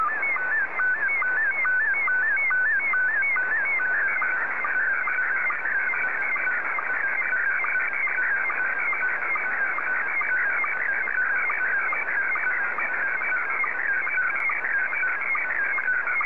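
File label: SSTV, Scottie 2; JA3QJQ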